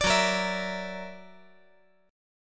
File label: F sharp Augmented 7th F sharp, chord